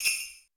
Roland U220 Electric Set, Drum Machines

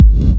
KICK PRO -L, Disco House; USB Soundscan vol.20 - Fresh Disco House I

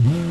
Ambient loop; Majin Tensei 2

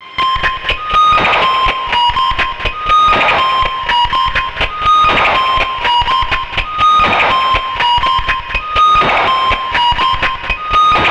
C1, Synth Line; 90 s Sample CDs; NiceBeats; SynthLine VOL-13

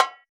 Tabla Tik; Roland BK-5; Percussion

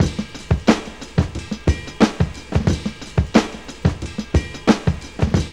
LOOSE BEAT 90, Funky Elements loops; Zero-G

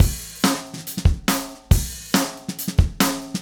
Acoustic Kit, Drums & Percussion

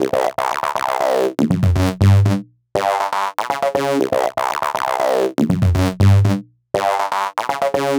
Techno Synth

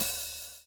SWNG

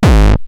Roland TR-909 BD; BD Selection

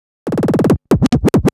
Scratch Fills 140BPM

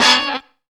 STOP HIT; Zero-G; Funky Elements

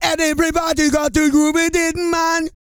REGGAE, Vocal Planet World Voices

